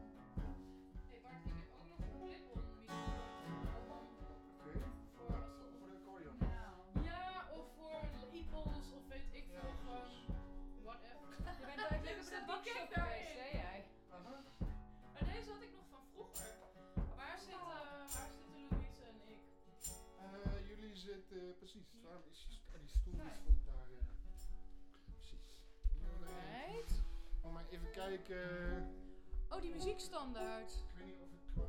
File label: Voc Fig8